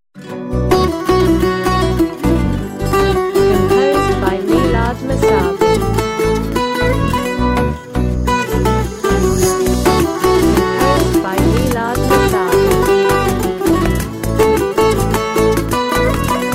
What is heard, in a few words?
music 6 8